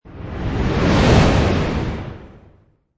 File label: Fireball Pass By, Fireball or torch swipe through air with a whoosh, fire pass by left to right; Flame Sound Effects; LynneMusic